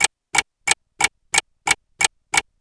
Clock

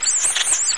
mantis

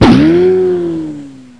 ball

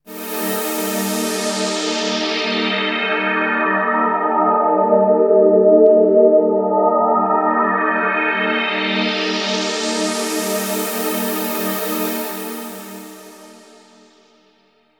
SYNTH PAD AMBNT 160 C, Ambient; eJay; Sphere

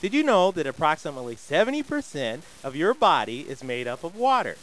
water

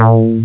mono bass; The Atari Compendium (Toad Computers) (1994); music

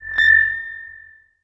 Nav Buoy verb; Earth and Beyond; EA Games

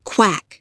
QUACK

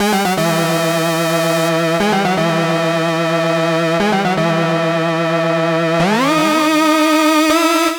synth; widdagroovebox

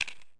PICK UP ITEM; Sierra On-Line; SWAT2DEMO

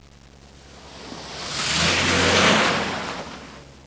car, transpo cars

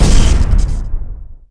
statweap explo; stat weapons; starship trooper

